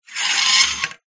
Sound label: household clothes hanger slide on metal rail